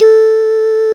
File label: Dooooooooh